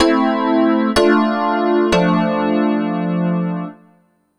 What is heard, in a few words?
DEEPHOUSE ORGAN 125 A